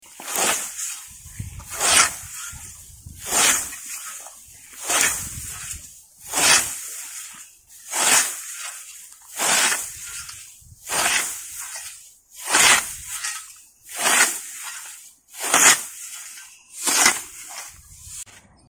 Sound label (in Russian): kosa bolishoi burian